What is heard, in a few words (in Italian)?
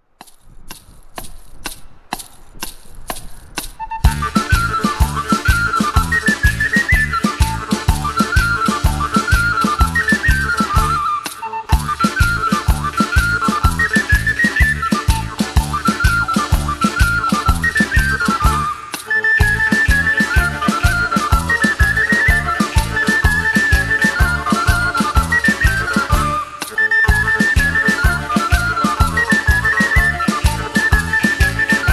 Tarantella